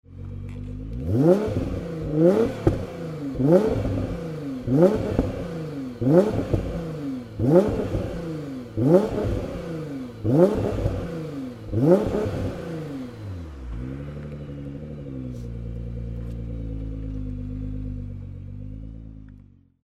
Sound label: Blip